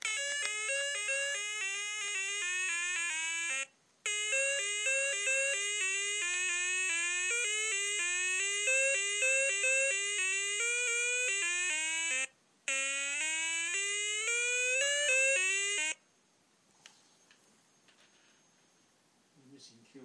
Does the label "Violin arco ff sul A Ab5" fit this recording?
No